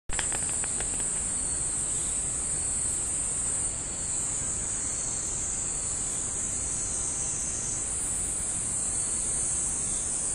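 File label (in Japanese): semi, cicada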